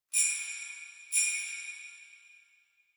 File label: Sleigh Bells Reverb Knock Hard Short